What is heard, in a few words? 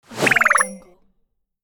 fall down whoosh